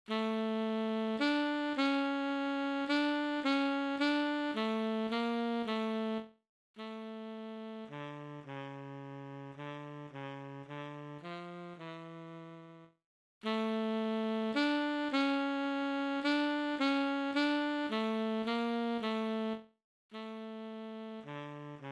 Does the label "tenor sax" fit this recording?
Yes